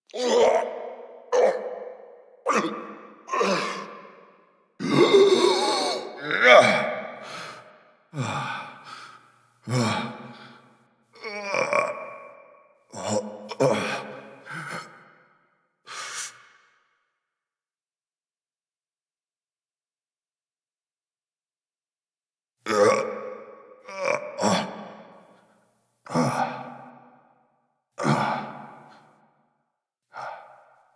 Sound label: Breaths; Warframe; The New War; Grineer Eidolon